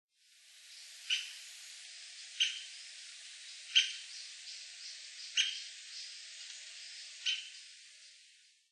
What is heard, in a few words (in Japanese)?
ostoaka; amami